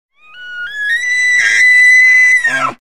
Wapiti, Elk Scream, Call. Single High Scream, Call. Medium Perspective.